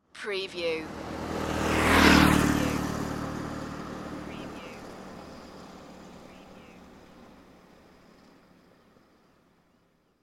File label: motorbike passing